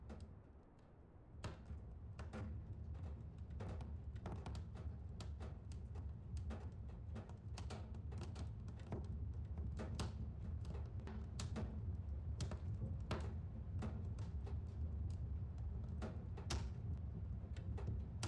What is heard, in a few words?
soundscape